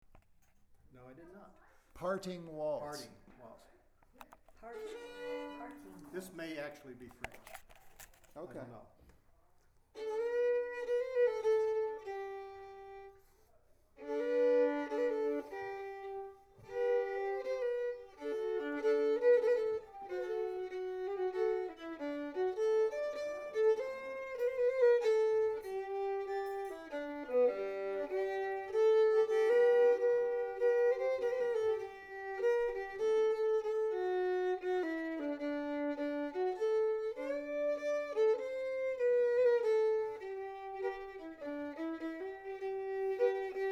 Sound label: Waltz